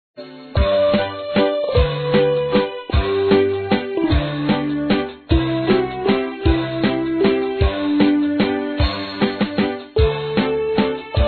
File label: music; temple